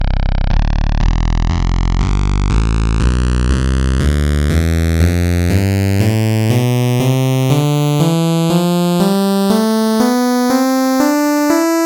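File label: fifth sawtooth wave scale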